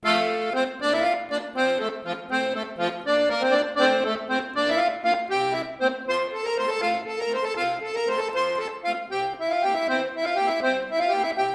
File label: Accordion Solo; Akkordeon; Mervyn Pratt; Turlough O’Carolan (1670-1738); irischen Komponisten